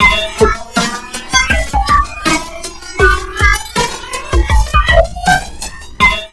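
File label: drum loops crazy processed, 80 bpm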